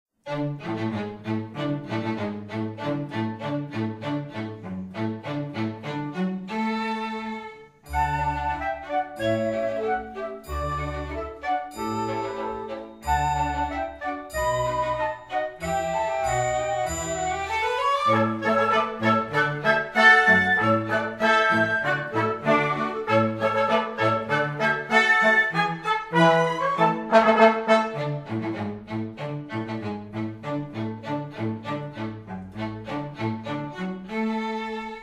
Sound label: March Militaire